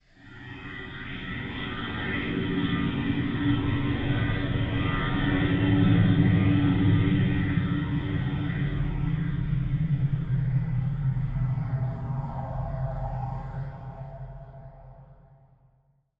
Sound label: Pad; Dubstep